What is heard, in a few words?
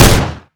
big shot shoot, weapons; tf2classic